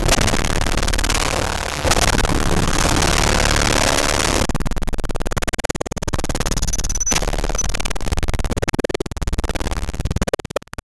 a robot malfunctioning with a series of glitchy sounds